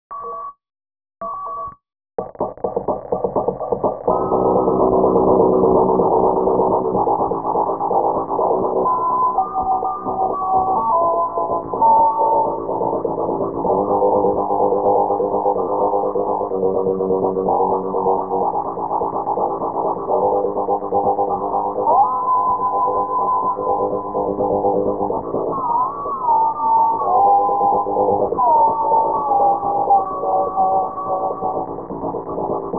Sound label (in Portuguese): bat eletr